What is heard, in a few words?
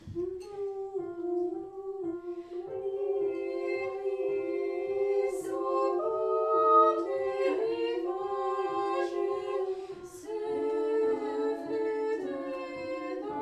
Cabaret